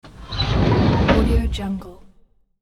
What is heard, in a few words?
drawer metal open quick squeaky; hollywoodedge